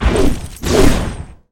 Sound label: Butcher attack